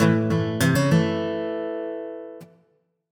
Guitar

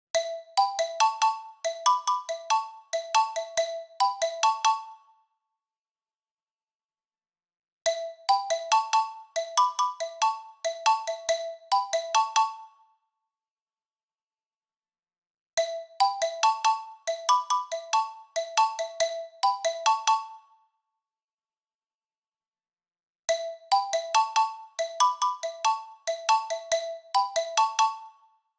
Open Door, ringtones; Yota Yotaphone

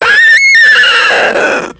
Strange Noise; Philips; Multimedia Studio

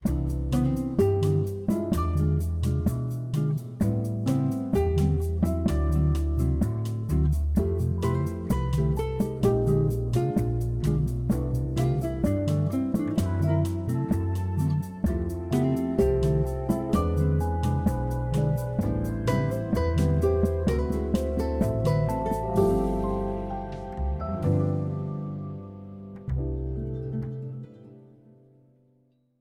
The Voyage acoustic, ringtones, Calm; Samsung Galaxy S23

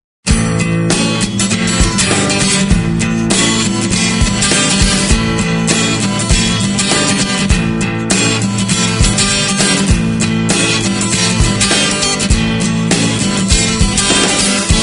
Indie; Nokia; 2760 Southeast Asia; Ringtones

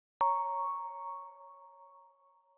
Flowering, notifications; Motorola Moto E20; Lenovo

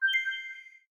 light unlock; LG G Vista VS880

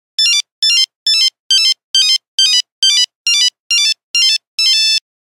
Hopping down, Nokia Buzzer; Windows Phone apps

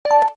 Keytone; LG SV850 Wine 4

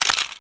Shutter; SKY IM-U680L; Camera

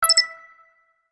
Space instant message; Nokia N79; Audio themes

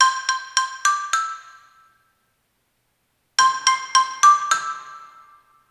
Stick

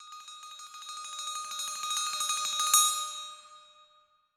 Steel, alarms; HTC; One-M7

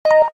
Keytone send; LG SV850 Wine 4

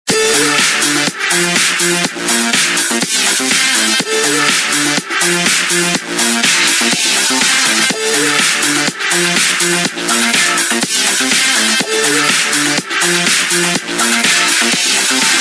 Ringtone, Samsung GT-B3313 Corby Mate

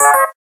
gayageum star, keytone; SKY IM-A830S-VEGA-Racer-2

